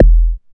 BD ANALOG; Sound & Vision - Gigapack II CD 2

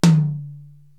Tom Hi, Korg TR-Rack

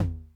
Tom Mid Accent Low Tone Max Decay, 909

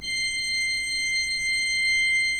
pipe organ, 3-D Audio

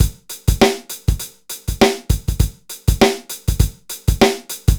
dry loop, BPM100

